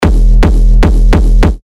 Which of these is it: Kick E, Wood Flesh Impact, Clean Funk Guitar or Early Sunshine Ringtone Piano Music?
Kick E